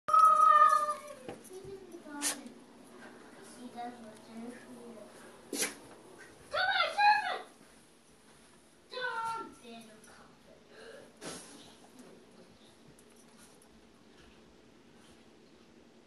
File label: toddler playing